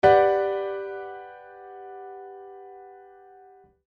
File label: Aural Tests Recog of chords; Grade 4; pop vocals